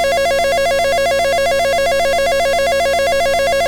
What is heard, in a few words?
warble, Tones